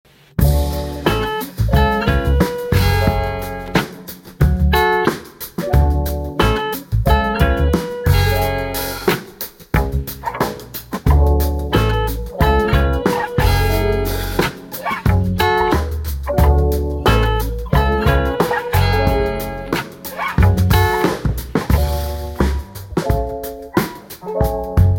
instrumental

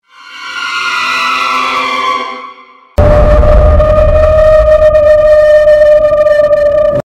horror sounds creepy sounds sounds scary

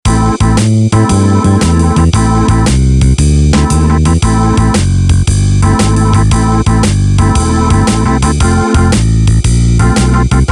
instrumental blues

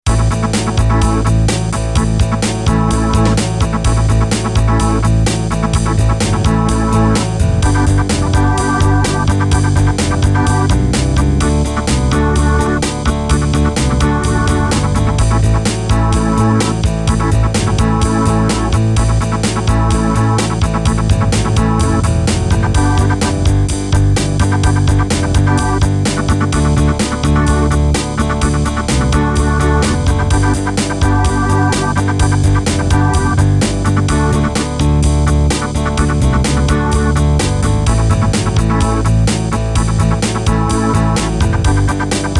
Rock instrumental

